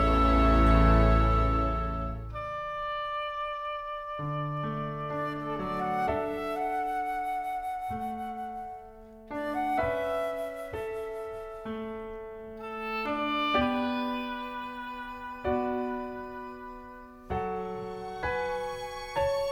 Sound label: accompaniment